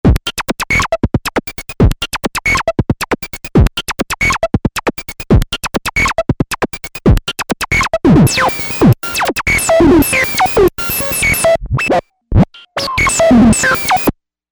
beats

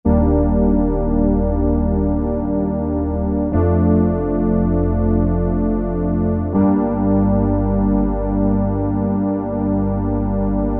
Classic Analog Carpet; synthesizer; Guess the synthesizer game

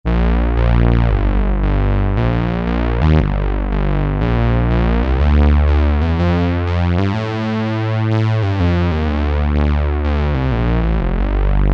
Taurus Bass

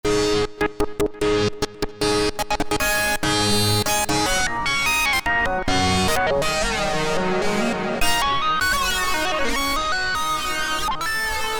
Chaos Lead, Roland JP-8000; Factory Patches

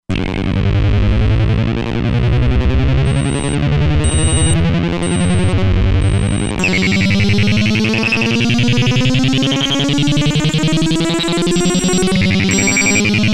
Full Charge, synth

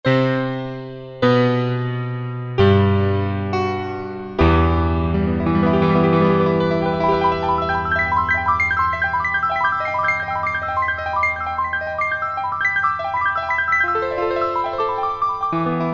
Classic, Alesis ZA Stereo Jazz Piano

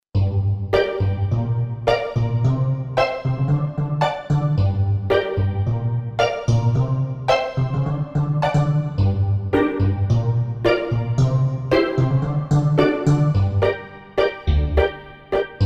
Steel Pizz, Roland JV-880; Factory Patches

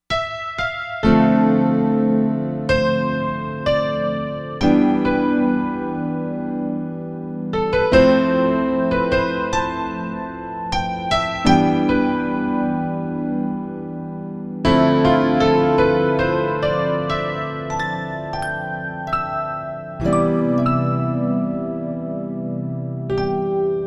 Ballad Stack

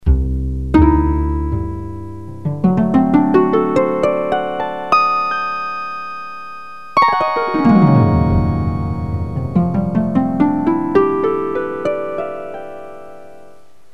Keyboard

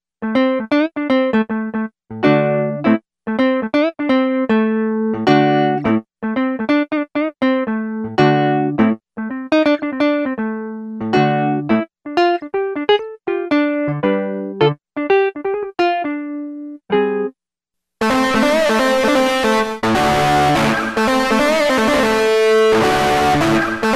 DIST.+GATE REV, Yamaha REX50

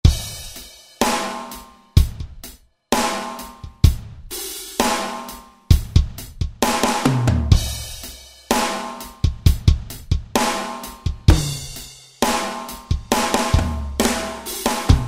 Fat Rock KIT; Roland SR-JV80-10 Bass & Drums

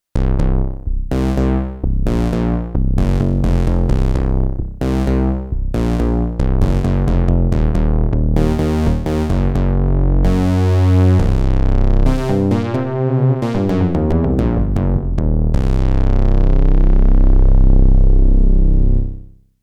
Modular Bass, Roland JD-800; Factory Patches

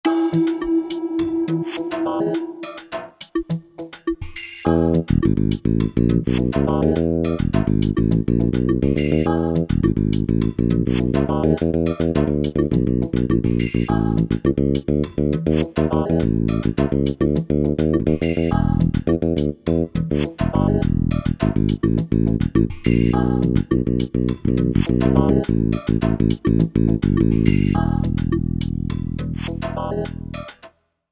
Sustain Pedal Jam, synth

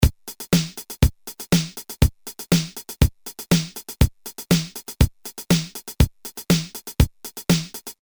Electric Pop, Boss DR-220A Preset Patterns

